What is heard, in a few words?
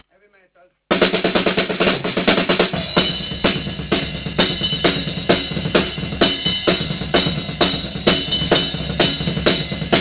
doublebass